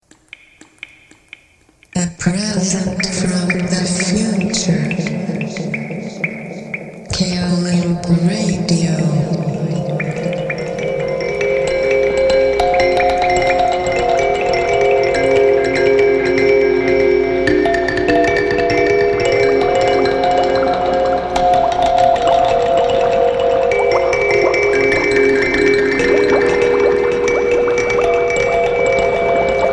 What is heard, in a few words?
kaolimba cross new melody